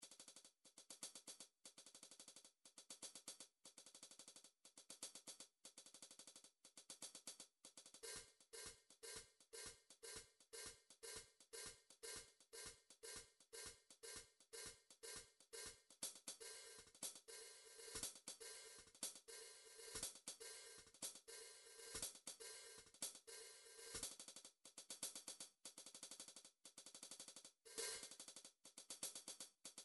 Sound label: midi drums percussion hi hat; Midi Vol 4 Drums & Percussion